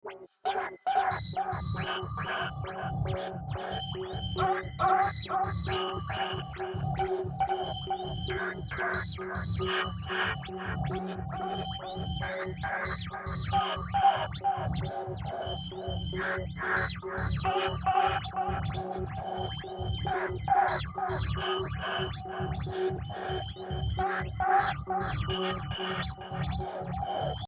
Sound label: pad